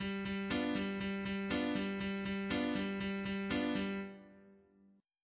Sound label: rock; orpheus